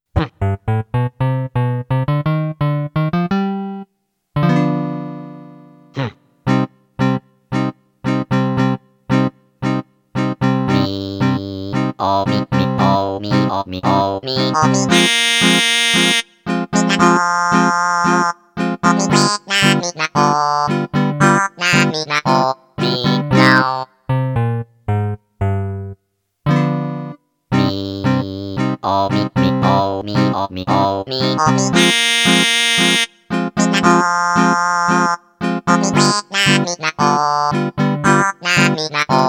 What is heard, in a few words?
Tango; animal forest totakeke music 2006